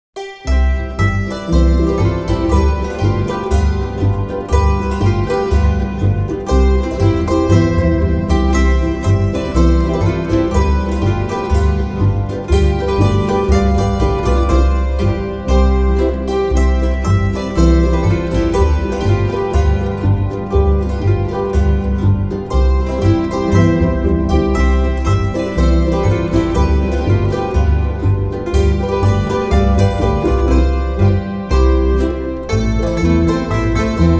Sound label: polka; Stubenmusik